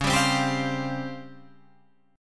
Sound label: Db Major 7th Flat 5th, Guitar Drop D